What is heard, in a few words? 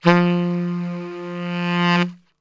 F 2 SAX, Brass Super Section; Best Service